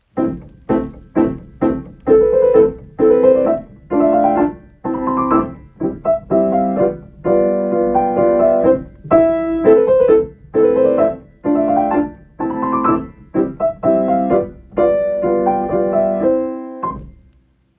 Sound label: Arabesque classical short